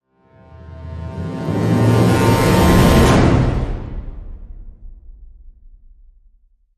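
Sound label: Hit Orchestral Brasshole C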